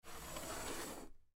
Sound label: Ceramic Slide Move, Ceramic Slide Urn; Slide Sound Effects; Foley; Objects; Blastwave